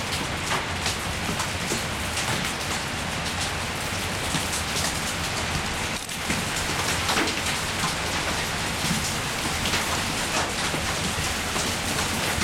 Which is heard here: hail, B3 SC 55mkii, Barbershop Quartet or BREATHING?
hail